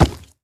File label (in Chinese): 猪灵蛮兵脚步声